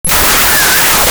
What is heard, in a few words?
Camera Shutter; 1001 Sound Effects; Foley